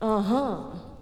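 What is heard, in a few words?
voice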